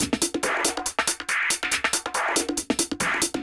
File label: TECHNO BEATS 140